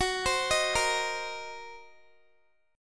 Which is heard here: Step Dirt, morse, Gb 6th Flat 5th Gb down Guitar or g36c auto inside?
Gb 6th Flat 5th Gb down Guitar